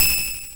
blips, opl3

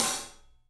Studio Hats, 3DPercussives

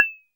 Perc, Clavia Micro-Modular

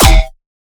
random gun, bass

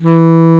E1 SMO SAX

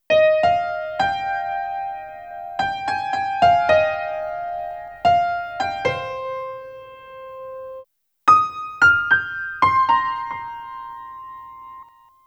After Dark Piano Lead, Electro Lounge; Sonic Foundry (Sony Creative Software); 111 Hotty

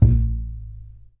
TUB BASS A 1

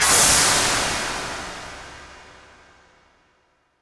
Crash, impact samples

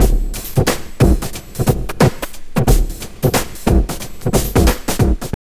TRIP 90, trip loop

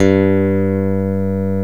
FACTOR BASS G; Greytsounds

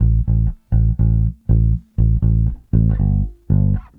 Bass, 70s Analog Funk

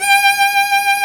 STR SOLO, String Master Series, Chamber Grp; Roland - String Master Series; Chamber Grp1

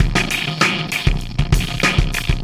System Overload Beat, Processed Drumkits

